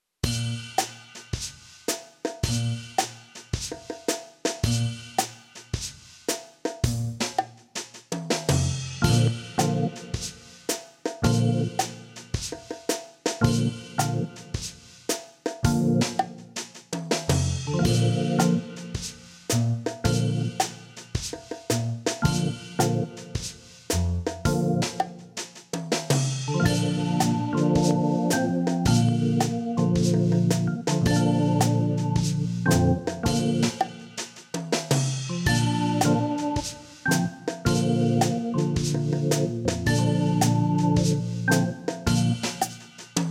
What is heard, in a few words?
Impro 1 chord, brass; backing tracks